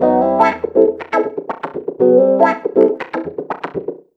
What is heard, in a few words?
120 WAH WAH, Electric Guitar Loops